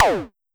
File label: Pollard Perc, Syndrum